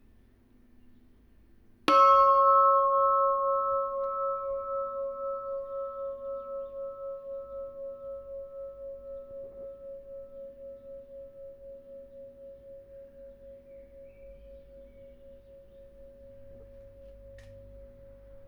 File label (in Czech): zvuk zvonu 26 kg